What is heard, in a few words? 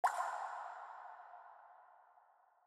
drips, injection; Dystopia